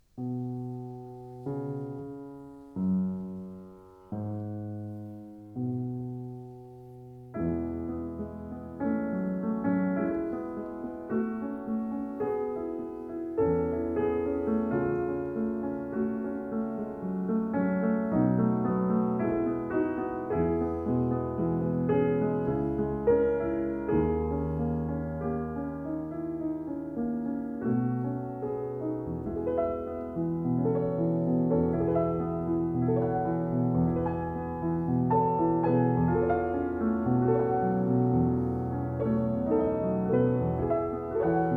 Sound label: Ave Maria, Die Glocken von Rom, piano; Mephisto Waltz No. 1, Harmonies poétiques et religieuses (excerpts) & other piano works